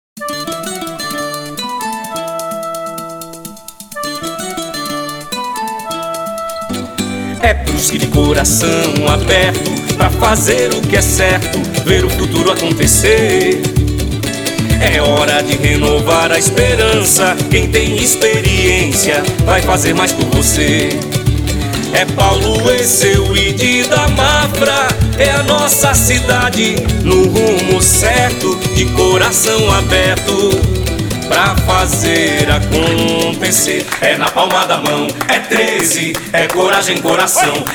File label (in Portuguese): jingles; pagode; sertanejo; jingles dos candidatos a prefeito na eleicao em Brusque